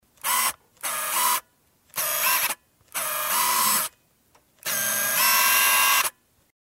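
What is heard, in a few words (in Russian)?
работа зума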